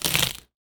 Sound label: Cabbage, household rhythm samples Hits